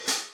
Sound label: Hat